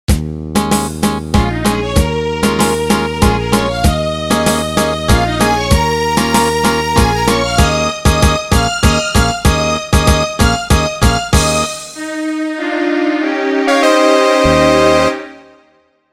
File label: Muddled Casino; Microsoft Entertainment Pack The Puzzle Collection